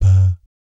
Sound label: BAP F#2, Vocal Jazz Fx